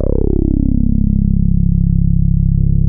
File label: TAURUS E2, synthesizer